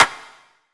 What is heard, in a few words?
clap one shot clean; Pop; Drums; Slate; SD PASADENA Pop Sample Pack